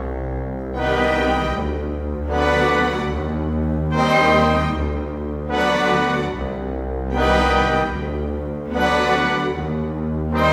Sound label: Cinematic Orchestra; Sonic Foundry (Sony Creative Software); Orchestral Series VOL-3 - Cinematic